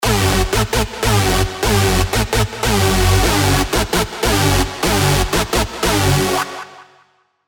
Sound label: Hoover; Hipnose; revealed spire mainstage drops